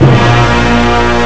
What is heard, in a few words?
loud